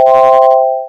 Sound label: checkmate; chess shorts